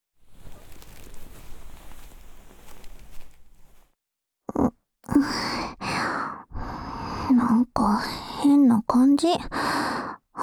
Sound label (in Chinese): 天诗咯吱咯吱的掏耳 左, ASMR